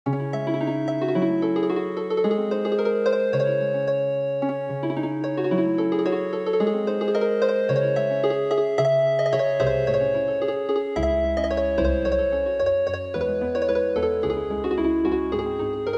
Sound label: Healing Harp, traditional; The Frost